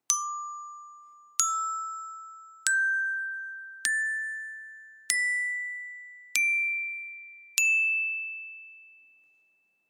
Seven tone Pentatonic glockenspiel with wooden club; KAP 007 KAP 907; auris